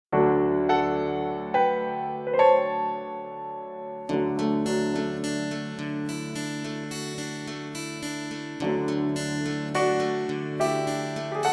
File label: D No Backing Vocals, backing tracks